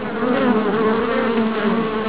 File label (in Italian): ape, versi degli animali; animalandia